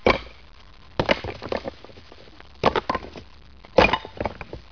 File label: nature